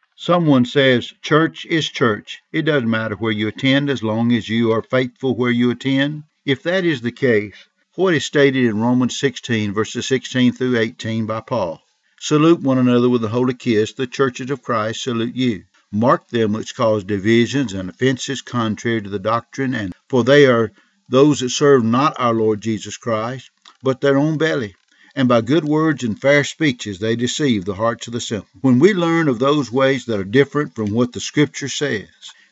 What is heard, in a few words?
Singing